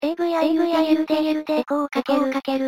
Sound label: delay